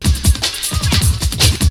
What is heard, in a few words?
BREAKBEATS, TECHNO